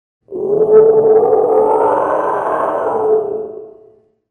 Monster Growl; Soundzabound Volume 3 Disc 3

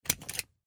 Seatbelt Unbuckle

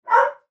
dog bark

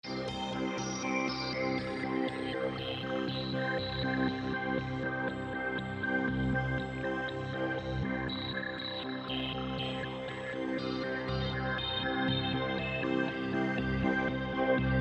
Pulse Robotic

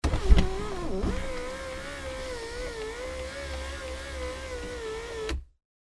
Car Sunroof Open

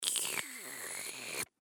Slurp

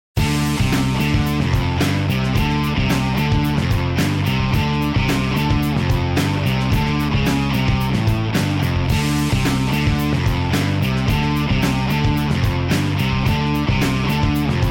Groove Machine

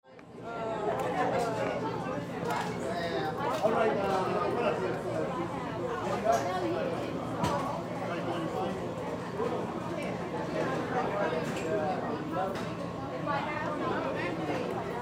restraunt

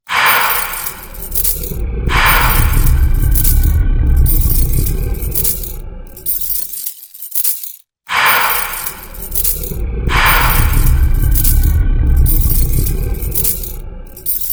Horror FX; Soundzabound Volume 3 Disc 3

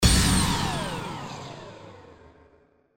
Blast Descend

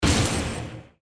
Blast

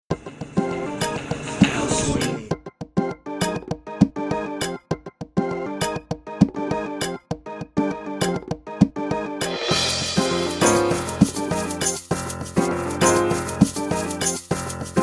Dancehall; Soundzabound

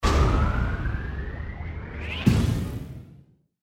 Ascend Burst

